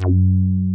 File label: HOUSE BASS; Masterbits; Dance Trax